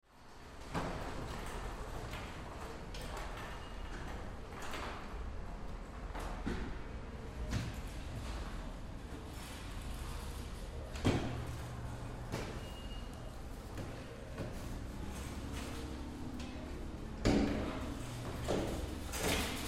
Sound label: Store Near Carts; Blastwave